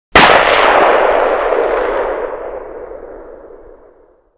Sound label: Inf Weapons; Red Orchestra 41-45